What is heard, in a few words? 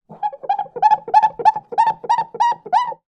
household window glass rub squeaks; sound effects one